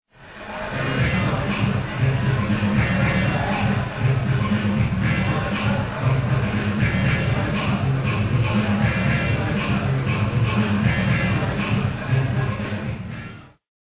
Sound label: dull souless dance music